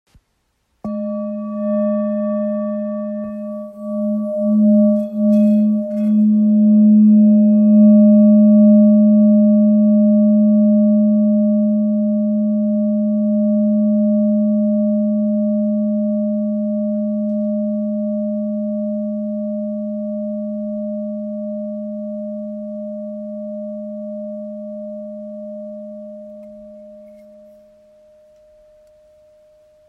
Bol therapeute la

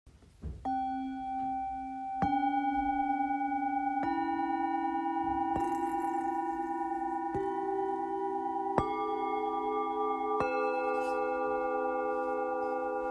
bols bengale eclipse

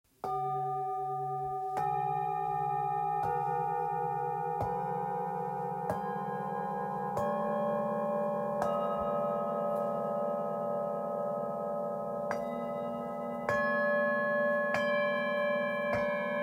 Set anciens orissa

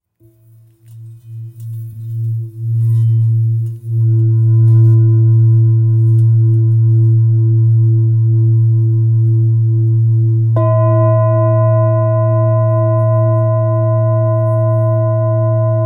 Bol bengale la

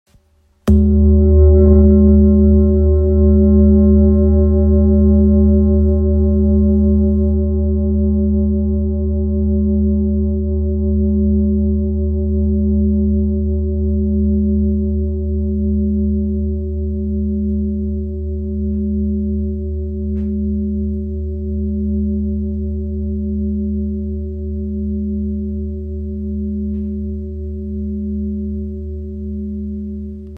Bol ramgandi sol diese

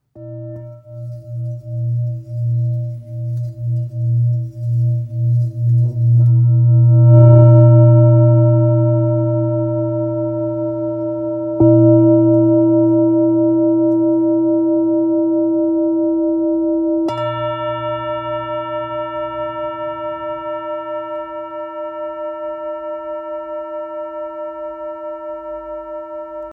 Bol ramgandi la diese

